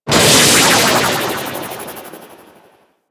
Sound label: Archwing Stealth Reverse; Warframe; Vehicles